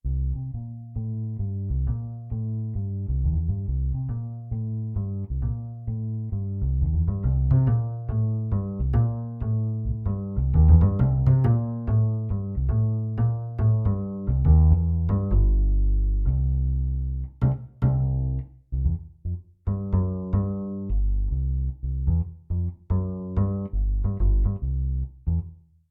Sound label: Bass acoustic noodling; XV-88